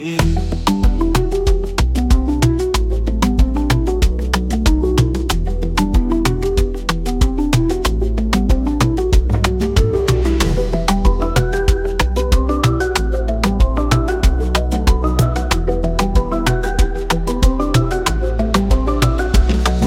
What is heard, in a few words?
RING BELL BEAT; Freebeat